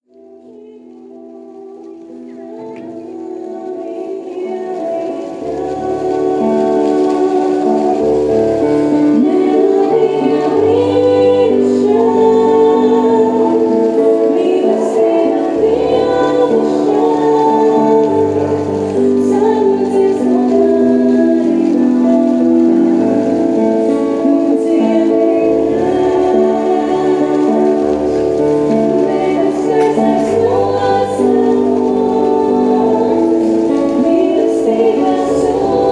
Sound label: Music in Cesis